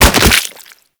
Infested Crawler Death Fall

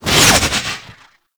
Concrete Archgun Projectile By; Warframe; Archwing

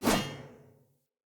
Polearm Whoosh; Weapons; Player; Sphere

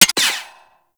Sniper Mech; Warframe; Tenno

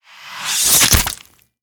Sword Through; Sacrifice Forest Night; Ballas

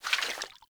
Vial Foley; Warframe; Warframes; Alchemist

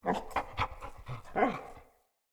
Wolf Idle Open Mouth; Animals; Vocals

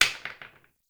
Plastic Broom Drop; Ambience; Gameplay; Warframe; Nineteen Ninety Nine

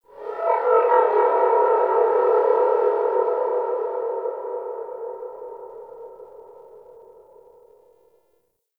Distant Sentient Drone; Warframe; Tau

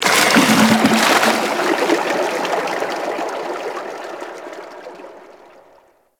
Loot Crate Water Release; Warframe

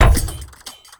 Layer Shot, weapons; Bubble Gun Pistol; Kompressa Prime

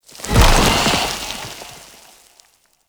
Sand Kavat Dissolve; Warframe; Mummy

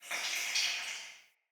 Cave Ocean Creature Close

